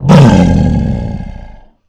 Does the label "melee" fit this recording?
Yes